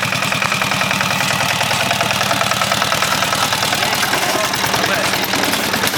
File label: Two wheel tractor; in Italy; Stavoli